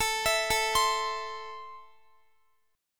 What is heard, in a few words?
A Minor, Guitar; arpeggiated; chordshape